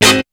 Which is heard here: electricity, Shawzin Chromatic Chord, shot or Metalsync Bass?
shot